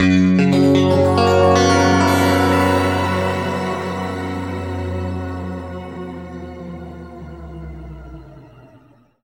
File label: F# P-S, guitar chords; East West; Steve Stevens Guitar Samples Collection